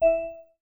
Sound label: Class Harp